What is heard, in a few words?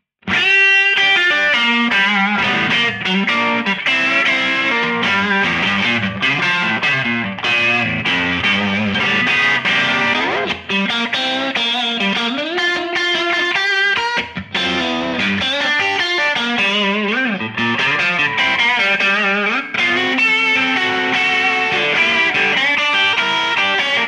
Blues Blusey Edge